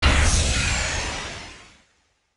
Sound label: Nitro Speed, Casual SoundFX; Casual SoundFX Pack